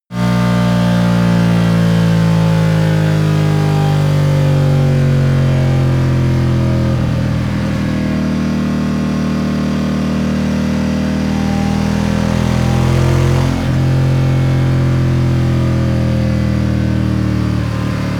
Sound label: motorcycle ducati cruiser deceleration